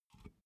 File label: big can filled pick up soft